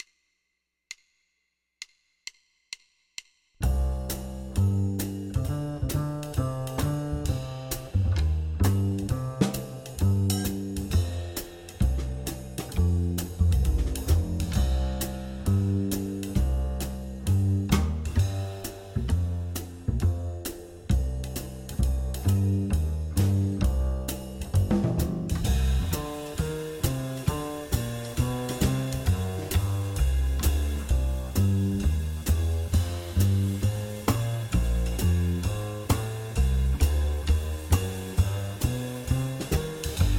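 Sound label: blues piano guitar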